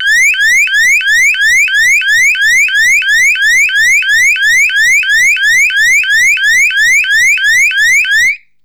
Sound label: Sounder